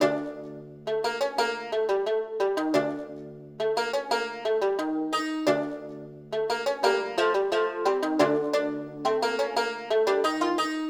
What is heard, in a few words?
Jubus, Hip Hop Exotica, 088 Dmin; Apple Loops; Big Fish Audio